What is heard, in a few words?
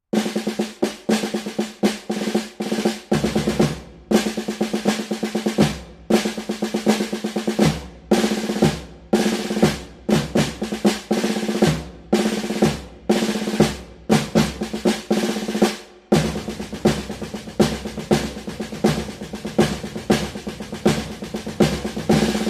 Snare drum March for Welcoming Ceremonies